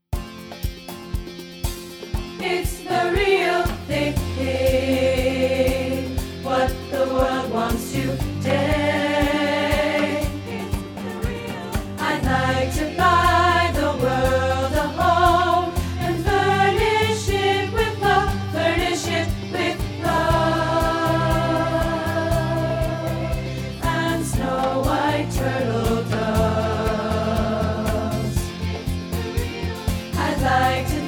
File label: ALTO; COKE-ID-LIKE